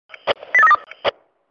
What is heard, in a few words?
Police Tone